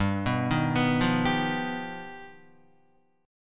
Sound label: G Augmented 7th, Guitar; G+7 arpeggiated; JGuitar